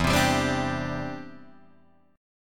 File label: E Minor 7th Sharp 5th, down, Guitar, Drop D